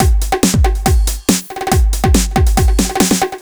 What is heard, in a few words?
Breakbeat 140 BPM